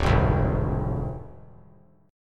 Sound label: D Minor 7th Flat 5th; Dm7b5; strummed